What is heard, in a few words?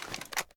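weapon foley assault rifle inspect; weapons; gmod; viper; scharlie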